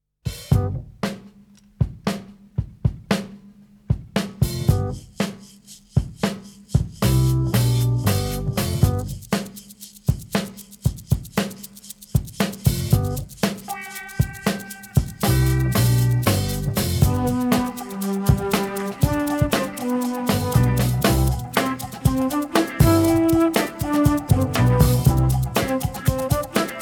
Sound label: big band